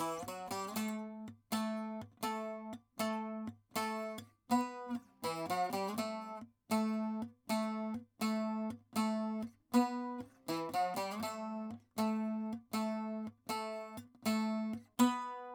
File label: saz